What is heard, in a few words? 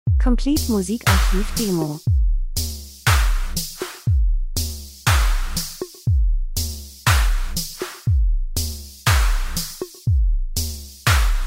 drums; Elektro Pop Gleichförmig warm getragen geheimnisvoll mechanisch